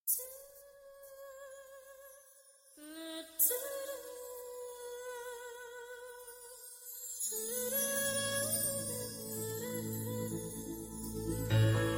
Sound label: Karaoke